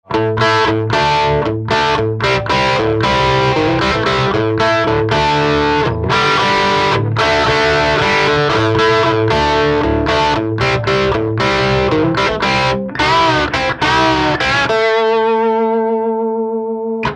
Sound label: jcm 900; amps